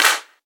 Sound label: Clap; Vengeance Samples